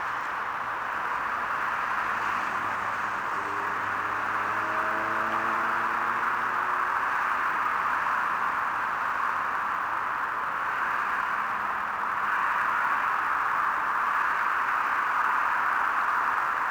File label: Paris River; ambience; Project Gotham Racing 2